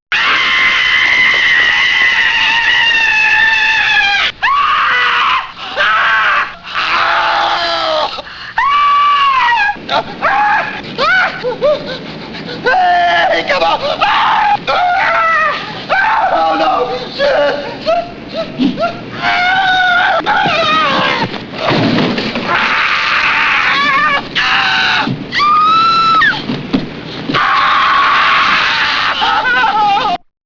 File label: scream